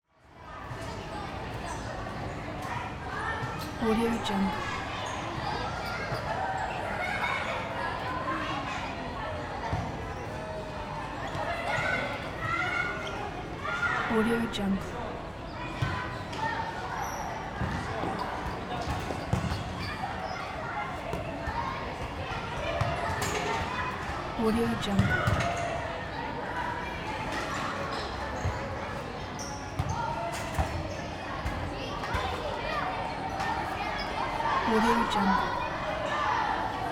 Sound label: school playground ambience children playing ball